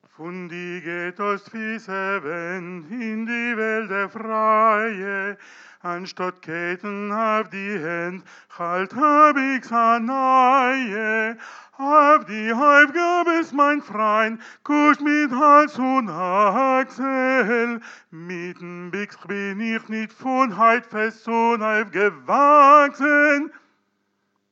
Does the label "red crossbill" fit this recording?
No